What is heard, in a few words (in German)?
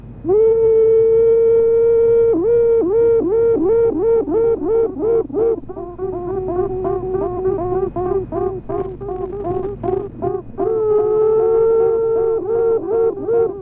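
Tüten und Quaken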